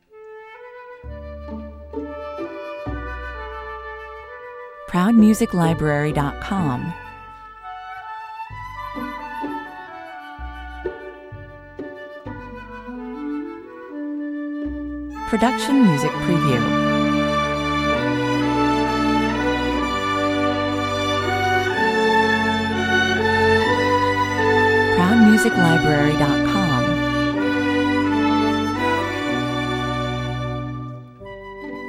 appalachian shaker tune 64 bpm

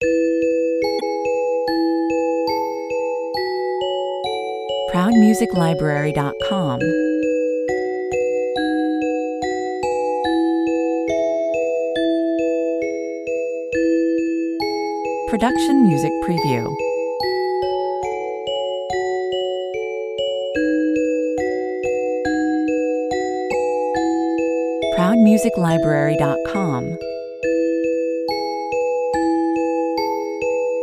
music box only

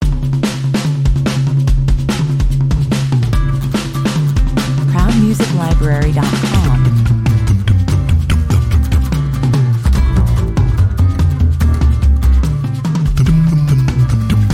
bass duel 72 bpm

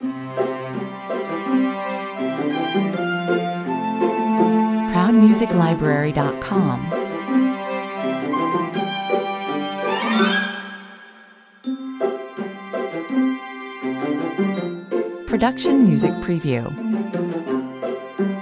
christmas dwarf 165 bpm

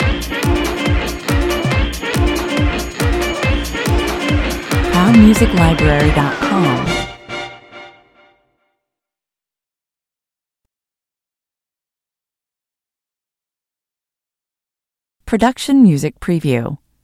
shuffle stinger

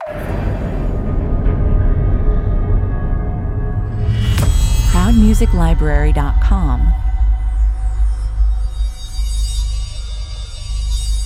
dark robot opener 87 bpm

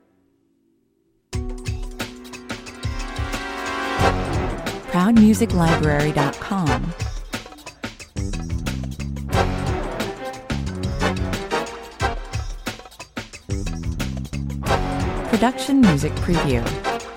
thriller 90 bpm

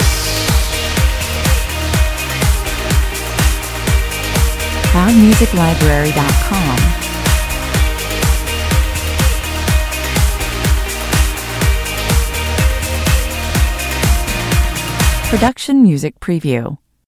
beach dance (loop)

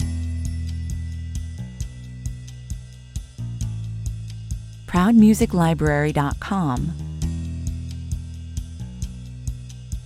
(bass drums perc)

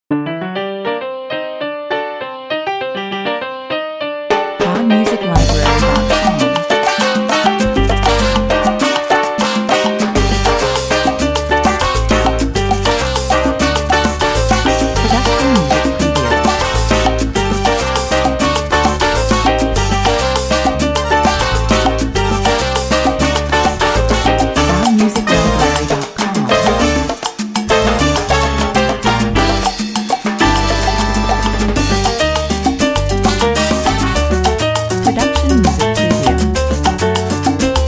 salsa, 100 bpm

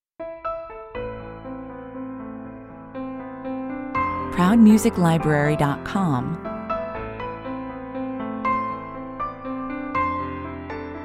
strange cases (piano only version)

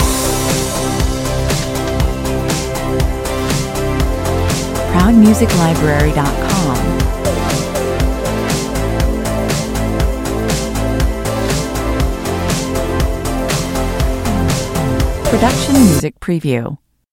synth wave loop

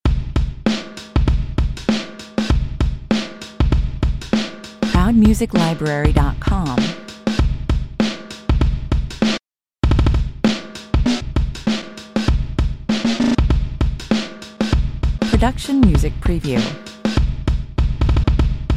destroy drums, 98 bpm; Proud Music Library

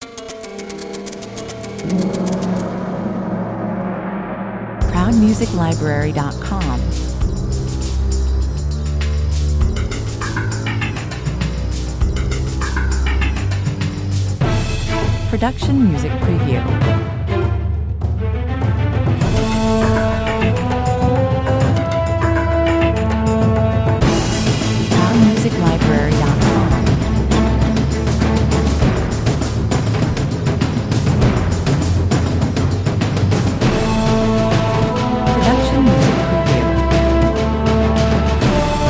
action movie trailer 100 bpm

